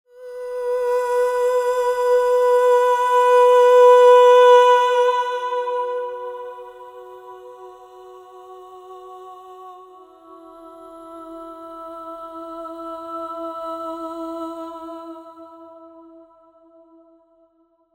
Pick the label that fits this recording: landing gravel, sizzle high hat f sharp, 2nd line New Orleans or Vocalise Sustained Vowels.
Vocalise Sustained Vowels